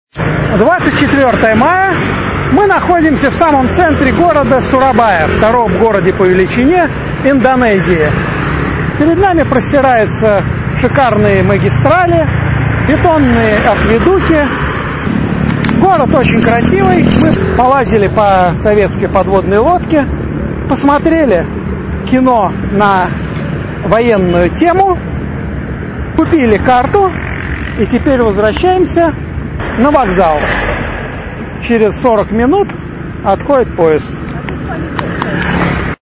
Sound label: indonesia sounds; geopoesia